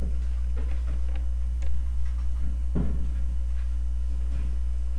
camera

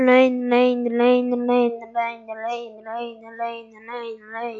rain

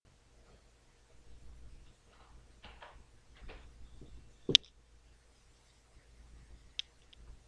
flute

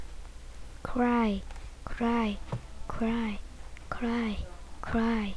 cry

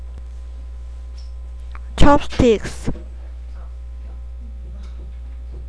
chopsticks

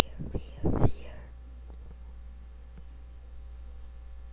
wheel

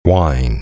whine

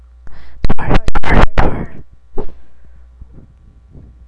door

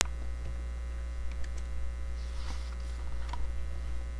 giggle

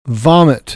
vomit

